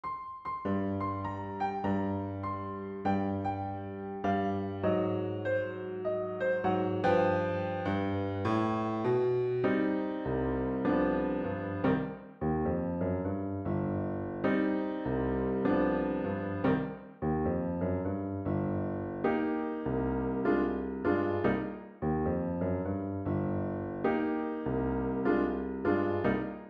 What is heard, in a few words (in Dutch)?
piano zonder klarinet